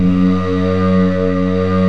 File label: OOH VOX S